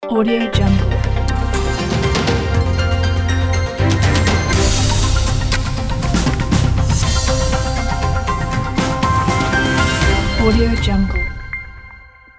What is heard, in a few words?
the morning news